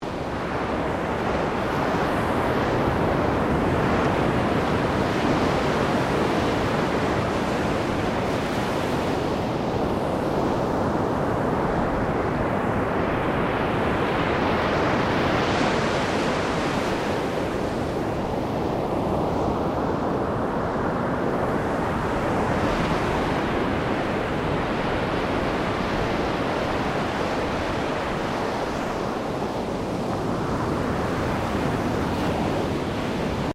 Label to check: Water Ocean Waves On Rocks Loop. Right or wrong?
Right